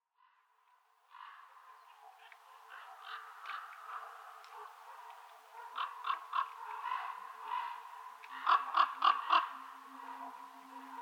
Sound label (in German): Kolkraben und Bussard Schorfheide